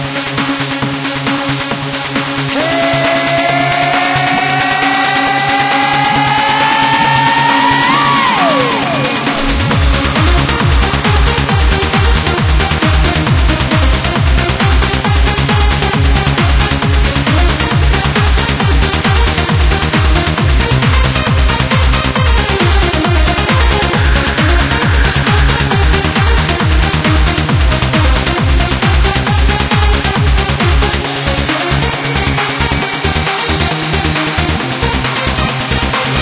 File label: keygen song